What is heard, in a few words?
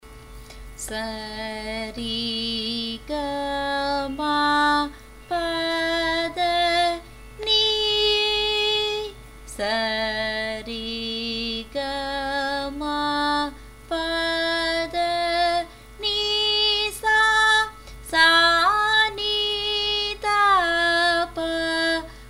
Sarali Varise; Sarali 07; Carnatic Music Basics